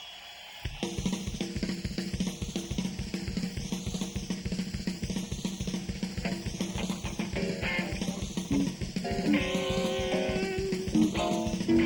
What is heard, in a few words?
Big Ball Jam